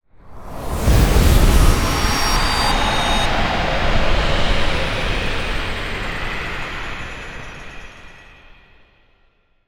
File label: sfx skill, ZombieSkill SFX; IdleRPG2024